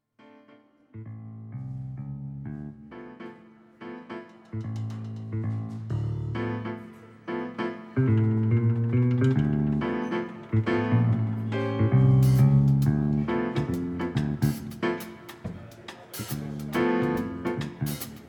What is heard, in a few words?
Summer Reggae